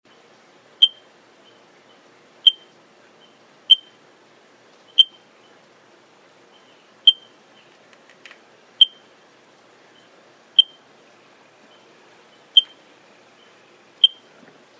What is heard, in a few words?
eiffingers tree frog